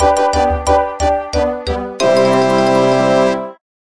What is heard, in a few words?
Cooking level up